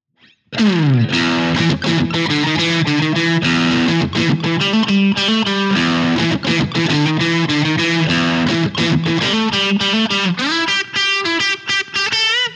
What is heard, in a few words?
characteristic sound; jensentone